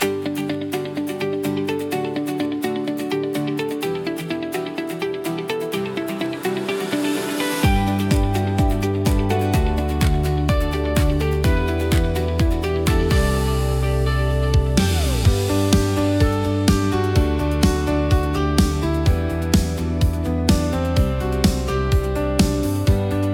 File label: corporate background music